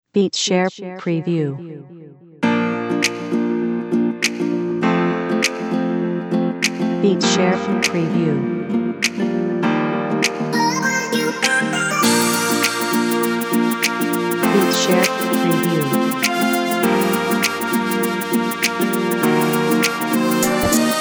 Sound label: pophiphop sample